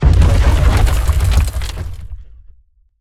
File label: Rock Prison Formation; Evolve; Behemoth